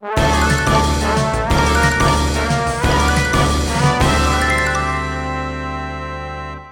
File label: Music Cartoon theme; Nancy Drew: Legend of the Crystal Skull